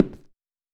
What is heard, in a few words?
High Heel